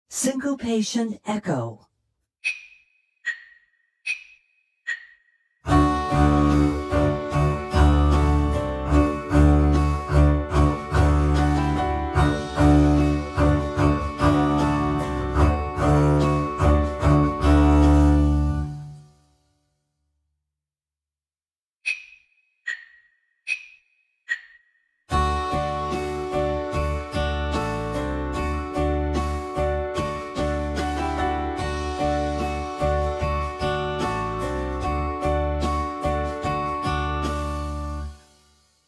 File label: Syncopation Echo